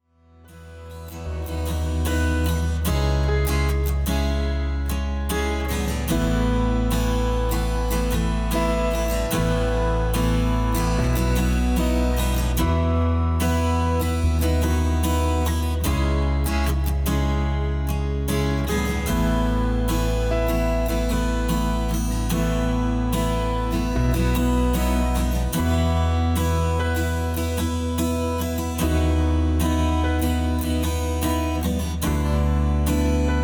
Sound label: Instrumental